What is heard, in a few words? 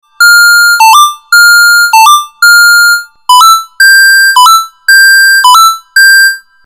lead